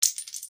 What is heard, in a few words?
glass falling